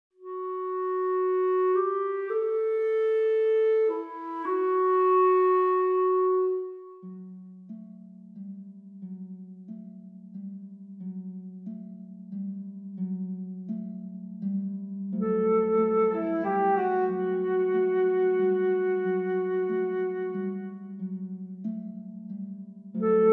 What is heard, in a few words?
film music